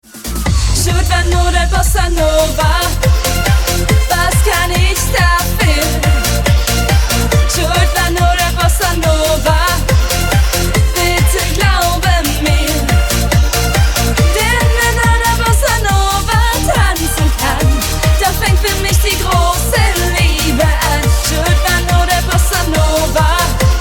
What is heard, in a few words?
Bossa Nova Cover